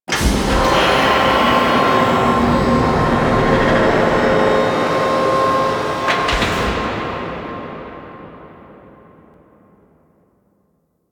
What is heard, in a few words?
Platform Raise; Kela De Thaym; Boss Intro